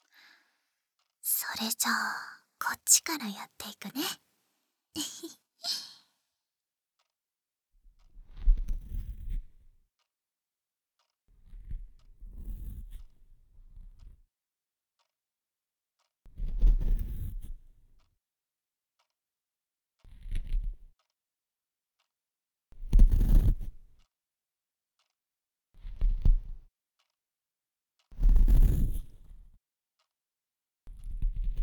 耳かき, そして梵天; 癒し系おっとり義妹

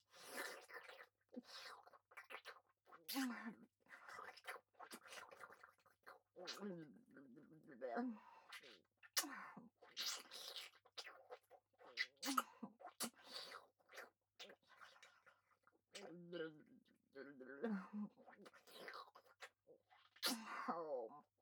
フェラ; ヒルダ

